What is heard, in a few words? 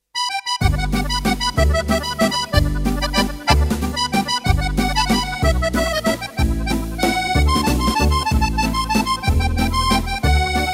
French musette